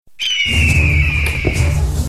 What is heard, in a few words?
eagle